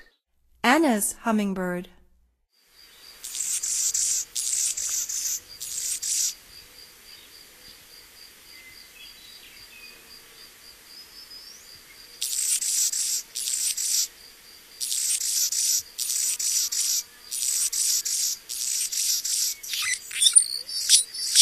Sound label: Annas Hummingbird, Songs of Birds of North America; Stokes